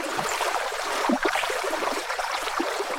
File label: boat row; Vehicles; mounts; freepixel